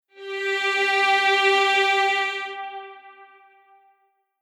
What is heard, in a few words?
Strings Layer Non Blend